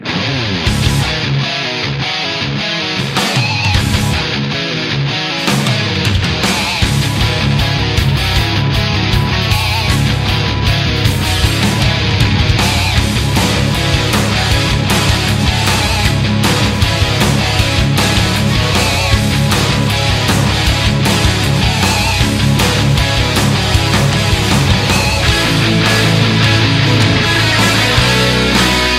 battle metal cover; final fantasy ix

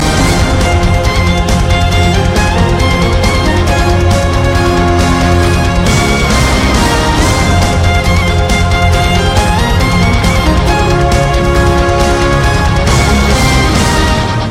bagpipe

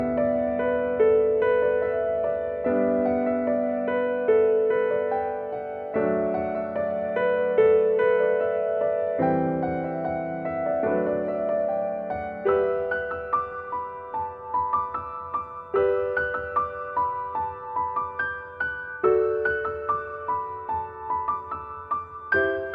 piano

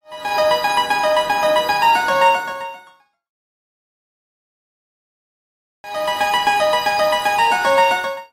cool sms sound

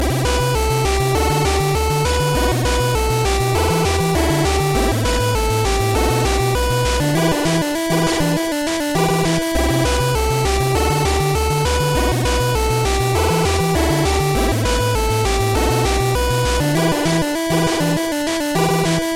8bit

